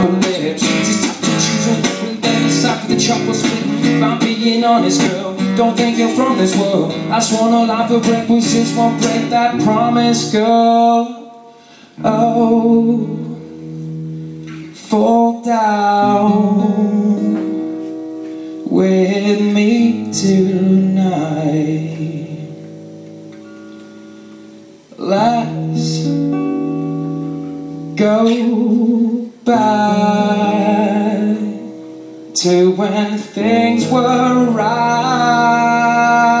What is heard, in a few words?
a bit of acoustic wednesday at tiger lounge